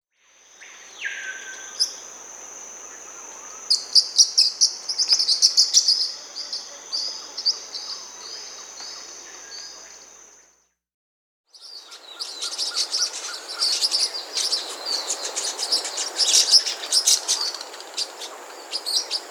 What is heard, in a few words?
Dusky-billed Parrotlet (Forpus sclateri), Venezuela, Peru; Birds of the Amazon; S. America